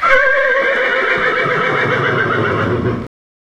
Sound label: Horses Neg